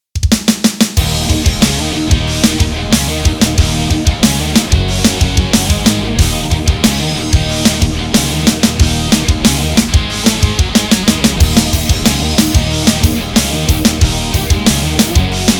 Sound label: Some heavy rock for demolitions